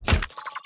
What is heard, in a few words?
Demon Minion Mine Activation; TANK; 3Souls